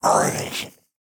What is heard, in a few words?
evil salamander, monsters; GGJ22